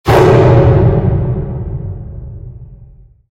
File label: furnace shot, ambient, harbor; Garry's Mod; tf2; cp harbor